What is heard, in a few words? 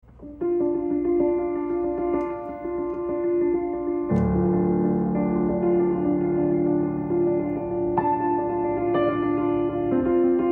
Piano